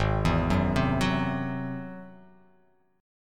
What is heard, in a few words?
G sharp Augmented 7th G sharp